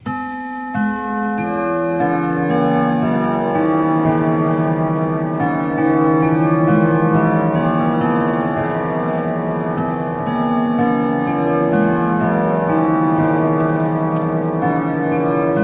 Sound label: Clock Grandfather